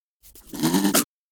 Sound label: wolf bored; LoL; monsters